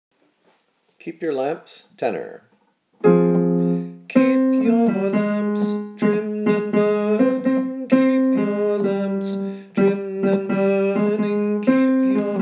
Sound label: Keep Your Lamps Tenor